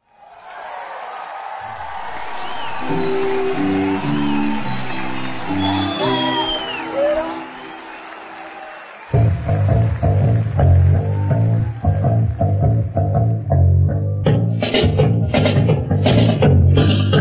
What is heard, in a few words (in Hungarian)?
Amator kazetta felvetel javitott